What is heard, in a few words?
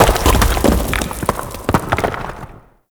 Large Rock Crumble; Ambience; Warframe; Grineer